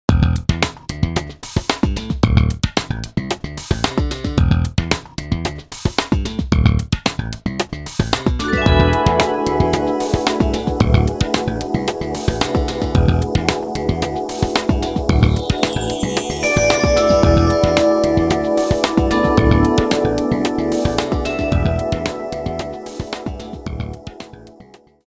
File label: jazz